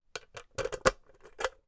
household safe small desktop metal key in lock key remove